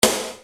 Snare Dr

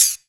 Yamaha CS1x Drums

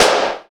DMA XD-5, DIGITAL

